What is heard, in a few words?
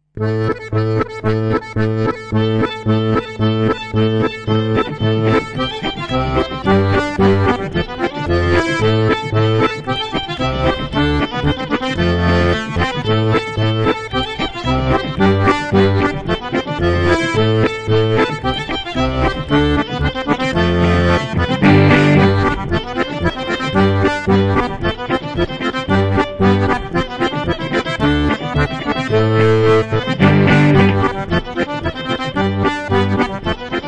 Polka